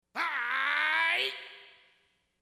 aaaaaaaaaai

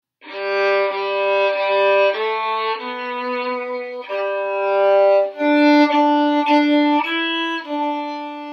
sleep song violin

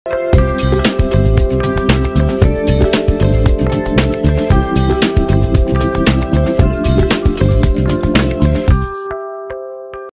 samsung logo balls

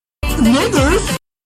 snickerrs

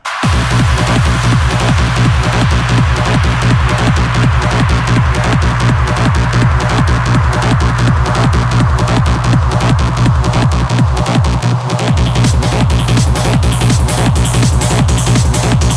hardcore trance

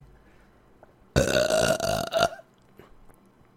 burp alert

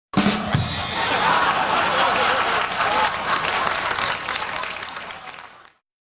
laughing drums

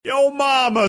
hehe

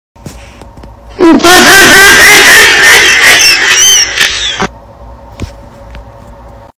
laughing bass boost

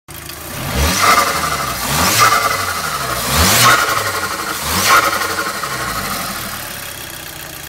golf gti turbo flutter stututu

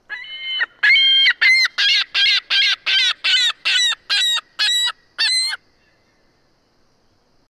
seagull sound

